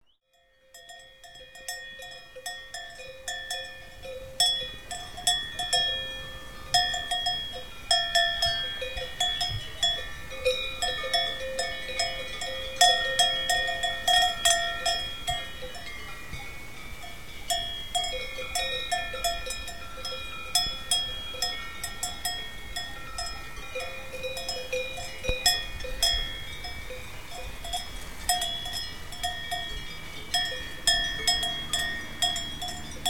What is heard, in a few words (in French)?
Cloches des vaches; MZ-N710; Phonography